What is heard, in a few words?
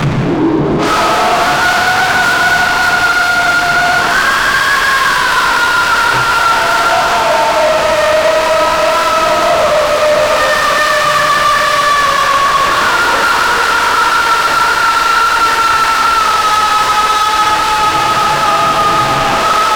supernatural horror movie, demon